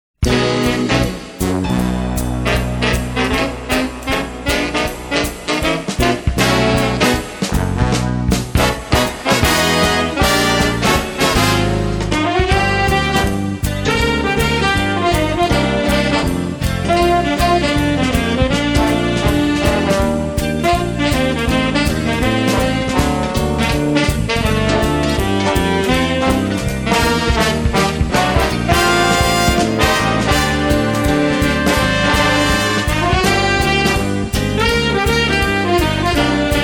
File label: Swing